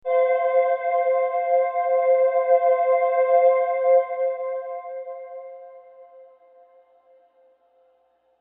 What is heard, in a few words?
Synth One Shot